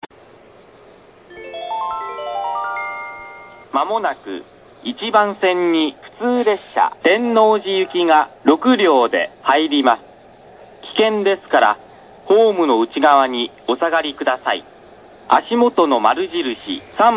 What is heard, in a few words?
sakaishi track1 local tennoji; nishinihon